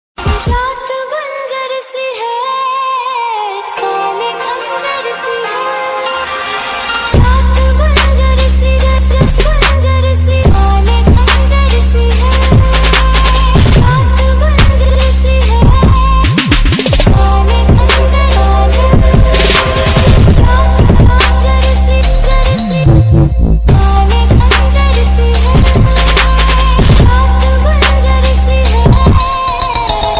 PSY Trance Mix

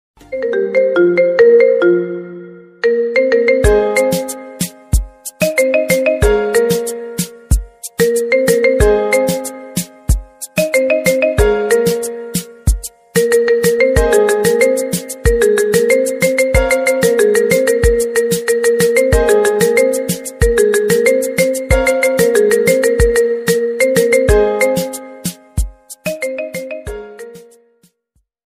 Instrumental Ringtone

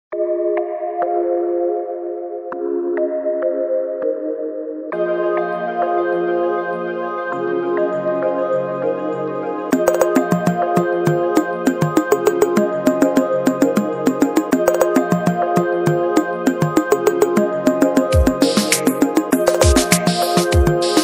Cosmic Pulse Instrumental Ringtone

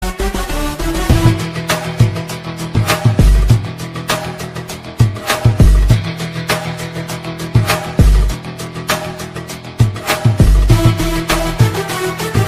Interval Bgm Ringtone; Master

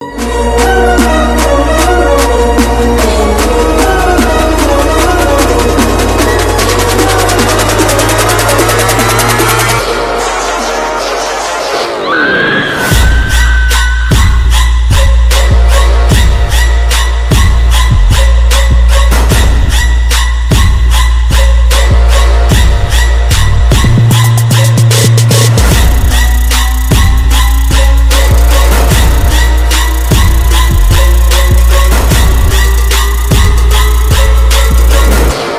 Psycho Trance Ringtone

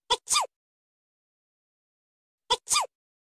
Sneez

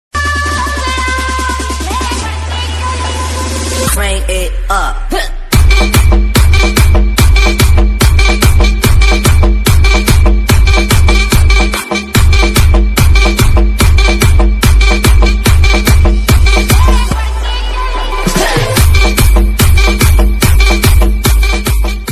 psy trance dhol Tasha

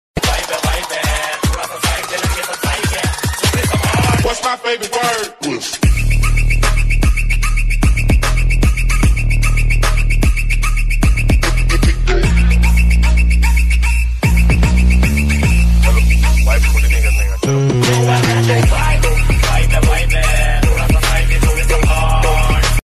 psy trance

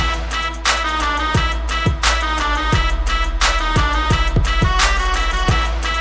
Notification Tone; Scam 1992

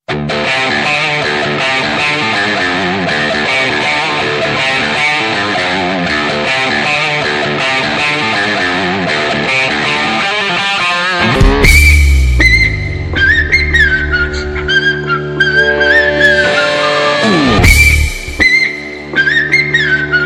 Whistle Theme Ringtone; Kaala

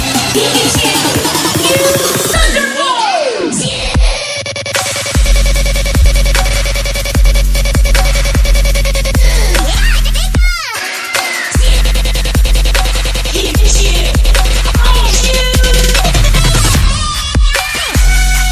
PIKACHU USE THUNDERBOLT Trap Remix

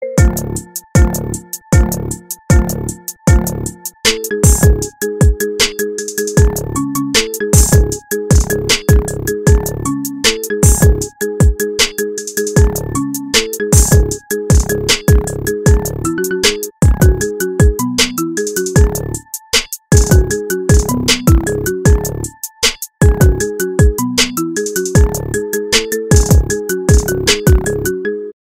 Marimba

